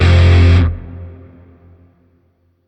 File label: Shawzin Blues Chord C; Music; Warframe; Grineer; Chords